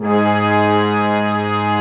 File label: BRASS2 G3, BRASS; AKAI S-Series CD-ROM Sound Library VOL-8